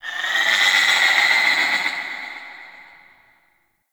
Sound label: Lair Exterior Creature; Ambience; Misc Creatures; Sentient; Warframe